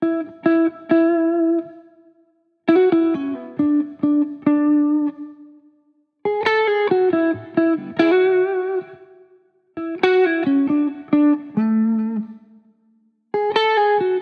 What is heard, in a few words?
Guitar Bpm135 Key A, 70s Guitars; Fox Samples - Back to the 70s Guitars